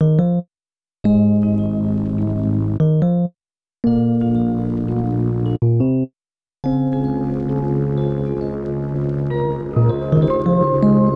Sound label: Melody, Hip-Hop